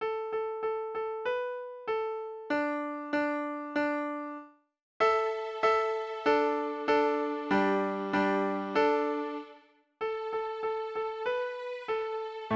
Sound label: Simple Square Dance